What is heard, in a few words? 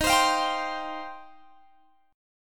Eb 6th Add 9th Eb, Guitar Open G; strummed